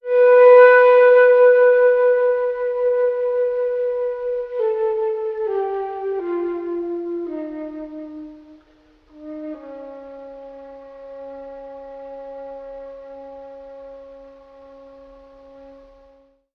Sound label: flute, Loops, Sad